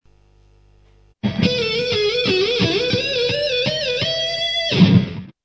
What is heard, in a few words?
sliding fingers, Travail des notes glissées; The B string